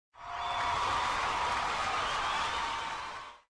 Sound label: Applause